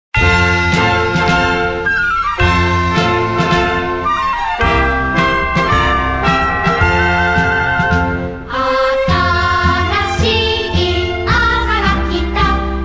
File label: radio intro